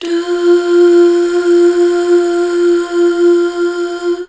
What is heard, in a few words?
DUUH, BACKGROUND